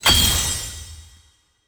Function Lv Up

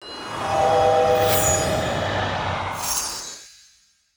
Show Mage

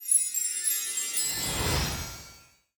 Obtain Treasure

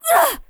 BOSS woman hurt

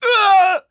BOSS Man Death

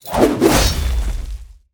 Spear Attack

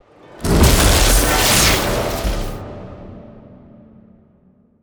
BOSS Fabao Skill

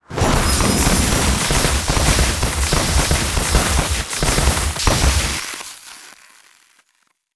Mage Skill

